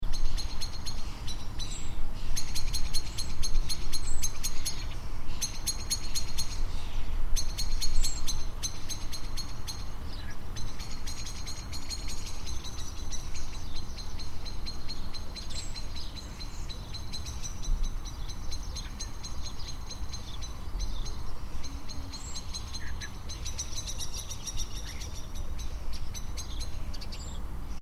Garden (Residential): Ambience, Birds Prominent, Slight Road In Background; Exterior garden birds; Outdoor; Stuart Duffield